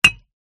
impact glass bottle hit on concrete block non break